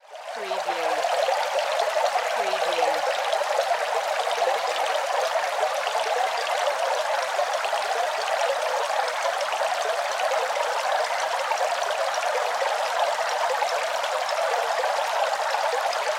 Fountain Run Small

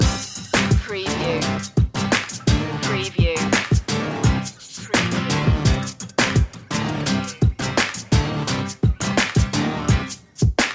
line dancing loop

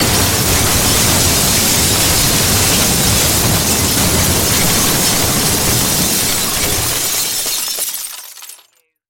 Crash Wood Glass

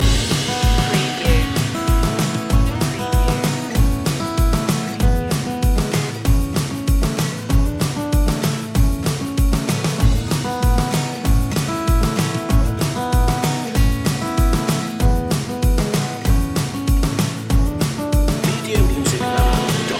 midnight shuffle loop

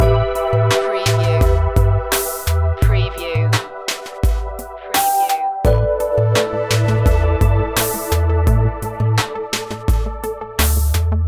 soft plug in loop

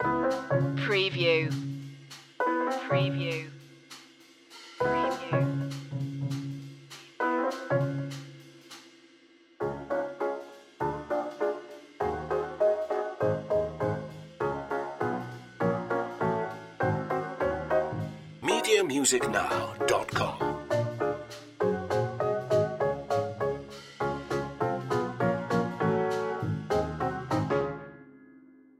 bugsy bop loop

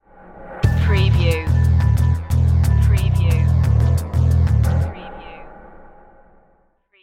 icescape stinger

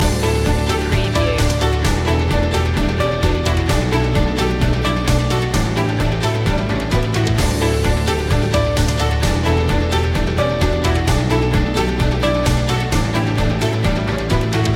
corporate technology loop

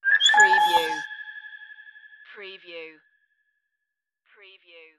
space message alert

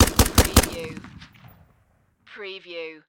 Machine Gun Steyr Aug

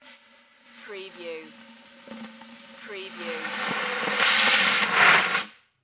Fire Extinguisher Long

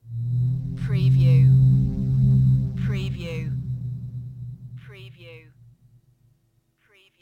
Moaning Synth Pad

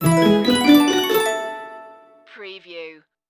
Musical

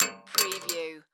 Bullet Shell 12 Gauge; sound-effects-bible

